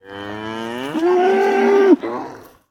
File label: Animal Cow Moo Mono